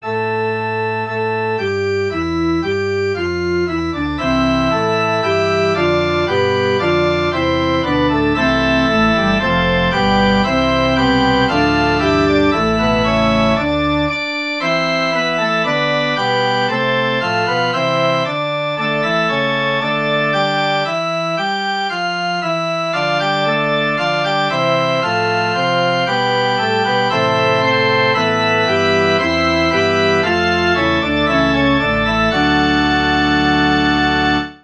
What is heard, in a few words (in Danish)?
forspil; o store gud